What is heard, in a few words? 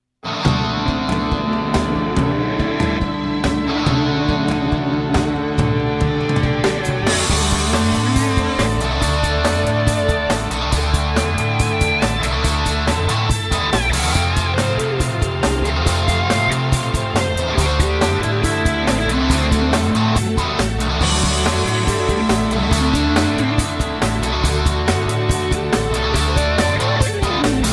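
Screaming Guitar Riff; meloboom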